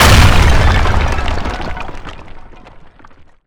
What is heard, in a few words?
impact stone large; skibidi toilet emperor